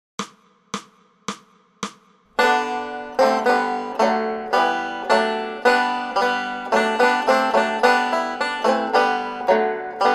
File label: Banjo; Deck the Halls; Banjo Tablature with Play-along Audio Tracks